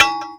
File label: metal hit; gcompris; scalesboard